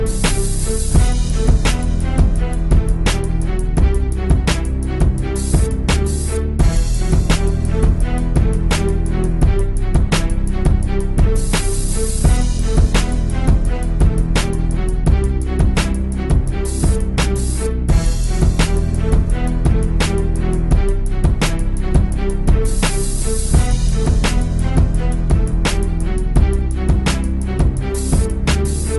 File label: Classical Orchestra